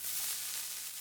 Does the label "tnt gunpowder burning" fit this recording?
Yes